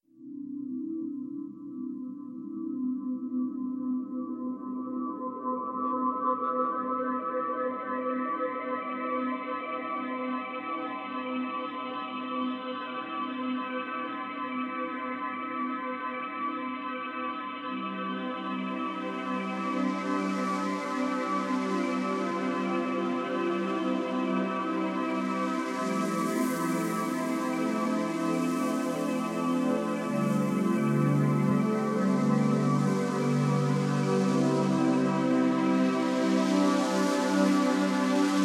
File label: 432 Hz